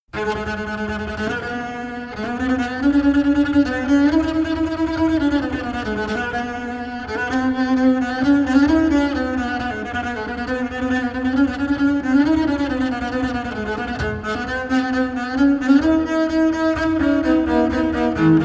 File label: Contrabass; Berio